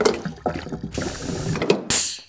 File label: fuel load; snds jack gmod